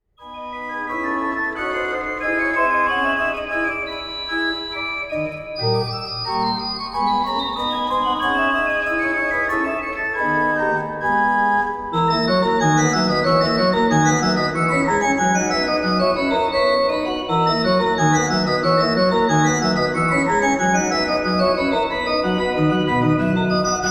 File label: organ; akg c414; microphone test with organ